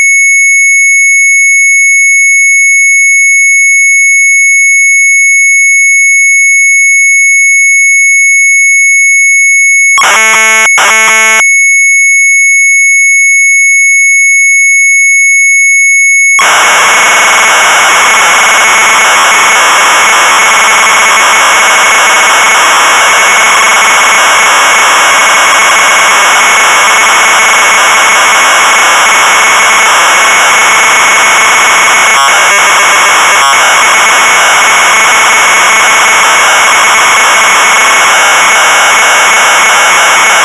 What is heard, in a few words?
Sharp MZ800 Tape; COMPUMASTER; retromelsarchive